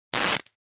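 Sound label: Cloth Split Rip Tear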